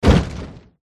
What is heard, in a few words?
kalmar attack contact mid; boss